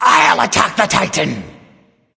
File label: laughing; Laughing Skull Bakasura